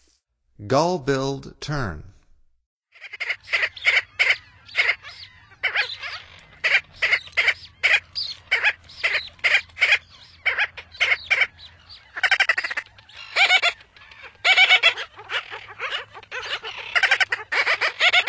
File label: Gull-billed Tern; North America; Stokes